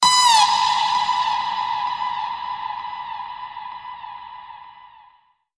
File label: bird call